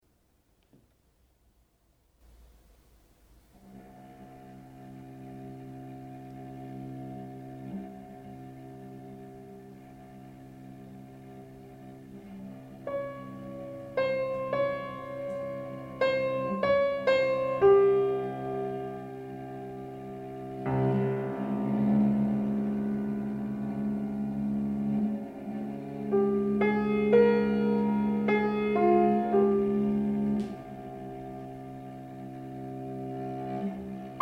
for violoncello and piano; Three haiku

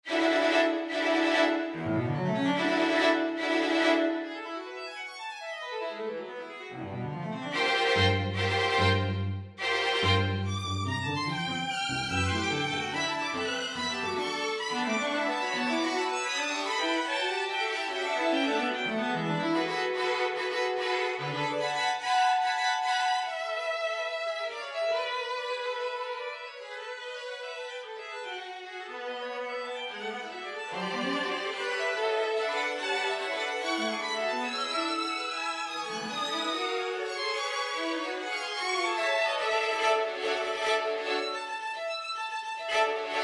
String Quartet; I. Toccata Americana

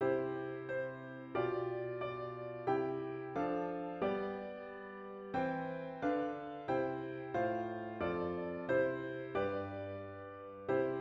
Harm fixed major

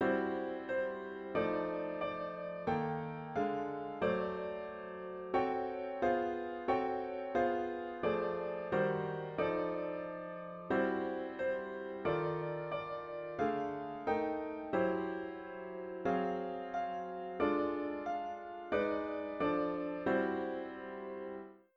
Harm fixed octatonic

